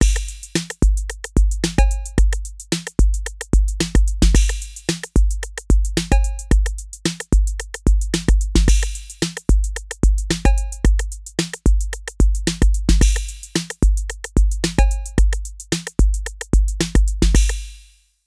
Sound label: tr808